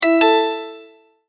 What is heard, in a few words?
multimedia tone verified